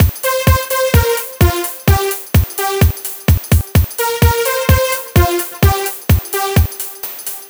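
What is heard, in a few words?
128 bpm no snare, combi loops, nu dance electro; Zero-G; Soundclash; Dusseldorfer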